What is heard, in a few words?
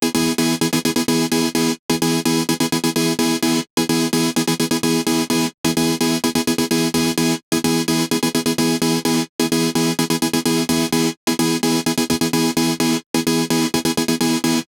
future rave saw, electronic music